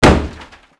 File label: wood; Mafie 1